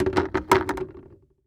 Household Bucket Plastic Bottom Set Down On Boardwalk